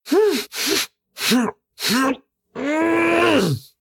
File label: Monster Spawn; Mouthless; Devour 2021 gamerip pc macos